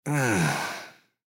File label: Human Moan; Moan Male Fifty Yrs; Pain; Sound Effects:Voice